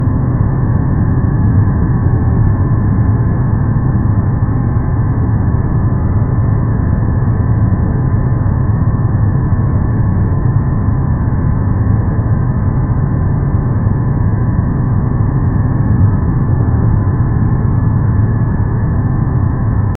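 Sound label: continue boost, rocket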